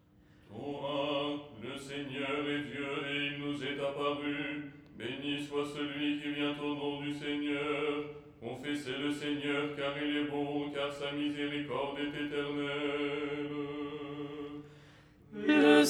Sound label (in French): alto